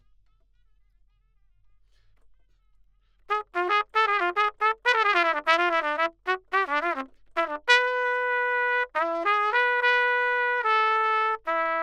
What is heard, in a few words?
Trumpet